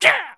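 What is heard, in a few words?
MON INSECT ATTACK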